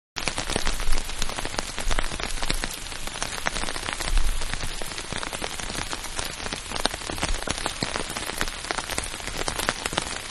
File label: Rain Heavy From Under Umbrella; Rain (Sound Effects:Weather); Manhattan Production Music